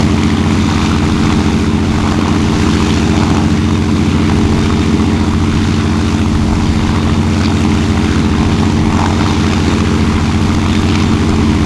bomber rear, engines; War Thunder

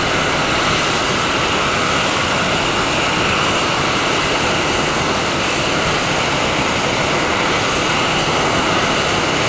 Aircrafts Engines Su27 Around Engine; DCS

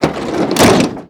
van slide open; Modern Warfare

